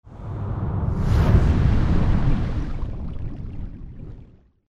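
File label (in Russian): темная тяжелая волна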